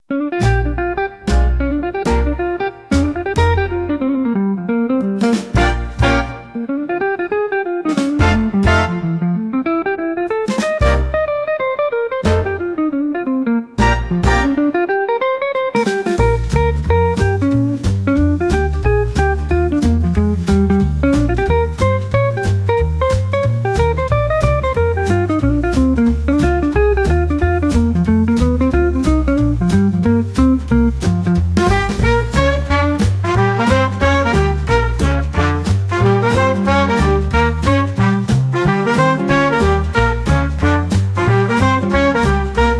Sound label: Guitar